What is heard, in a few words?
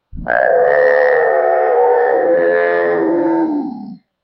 theropod dinosaur sniffs